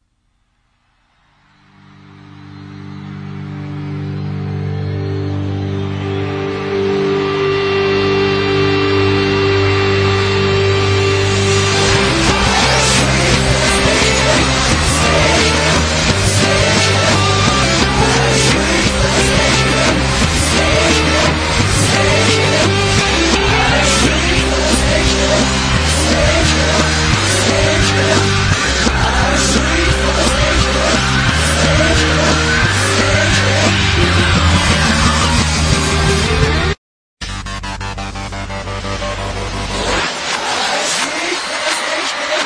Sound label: backwards